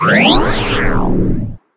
Energy Shield

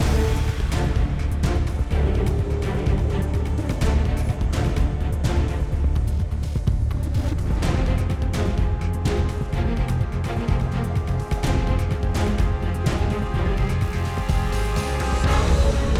Action Street; The Amazing Spider-Man 2

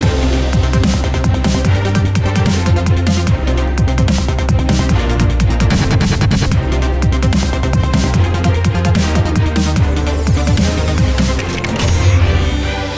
Final Boss Triumphant Loop

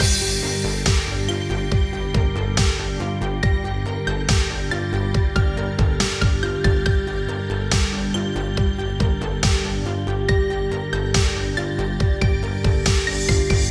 Music Idle; Cyber Hook

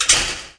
Equip Armor; game rip; lego ninjago rise of the snakes

